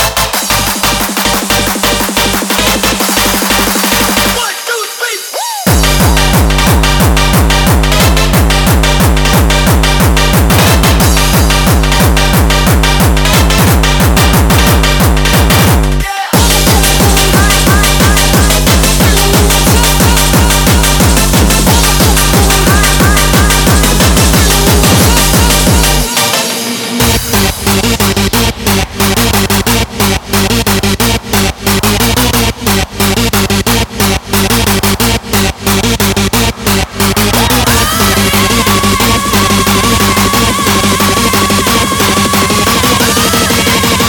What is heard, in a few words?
Gabber Kicks